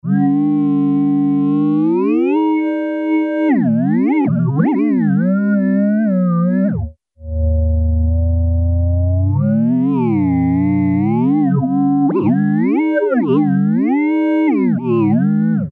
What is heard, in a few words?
Robo Vox; Alesis airSynth